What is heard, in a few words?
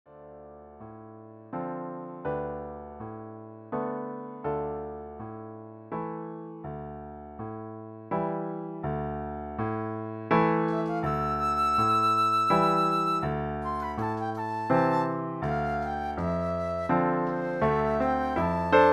midi sample